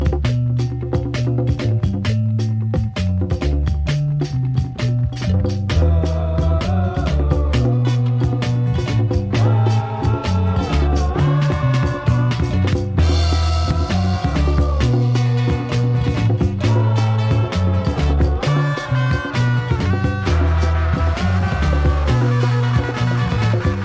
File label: Afro Funk Disco Boogie West African Disco